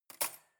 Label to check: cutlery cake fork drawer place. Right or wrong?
Right